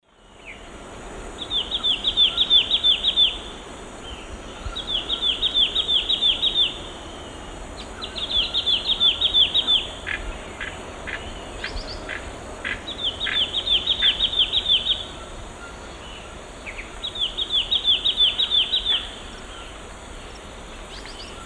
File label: Hylophilus thoracicus, song; S. America; Eastern Lowlands